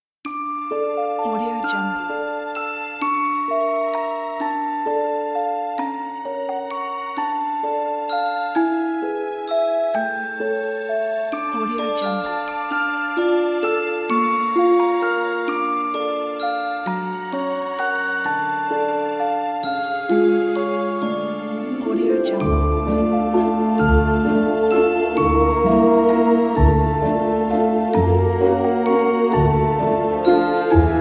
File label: fairy tale